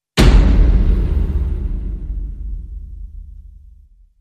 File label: Main Hit